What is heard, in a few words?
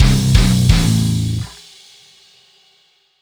Defeat; Dustwind